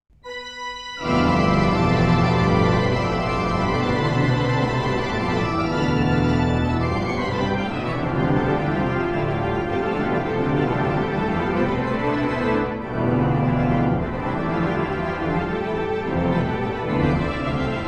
organ, Iceland; frikirkjan

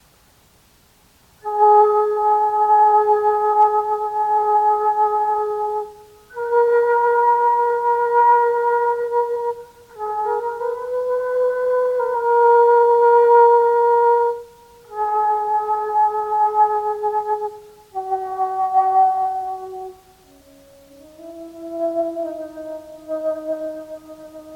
flute

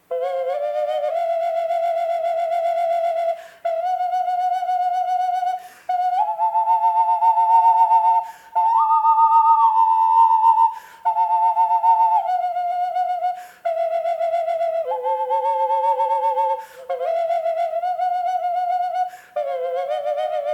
ocarina

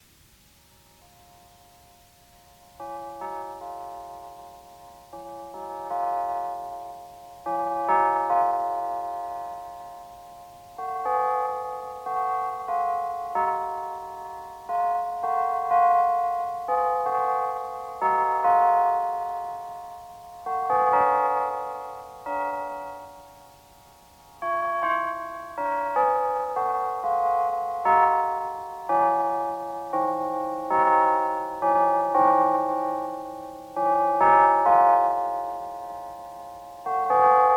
Chimes; Guru Brahma

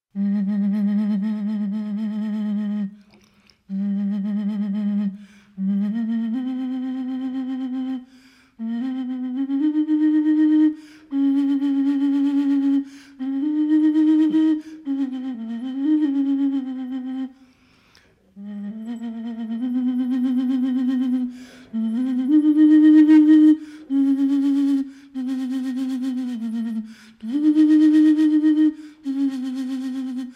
Dove ocarina

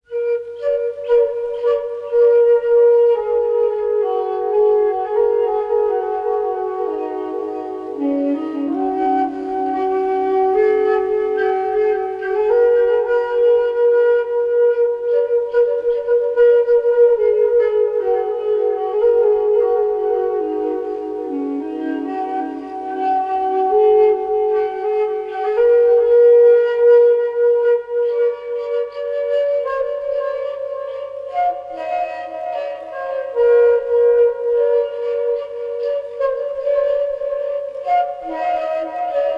Flute Music

